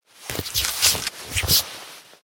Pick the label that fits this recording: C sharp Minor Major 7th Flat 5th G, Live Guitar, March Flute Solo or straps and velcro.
straps and velcro